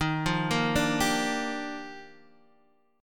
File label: G Minor 7th, Eb; Gm7/Eb arpeggiated; JGuitar